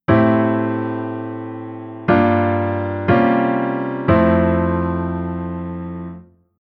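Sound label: Chord Secondary Dominant IVonV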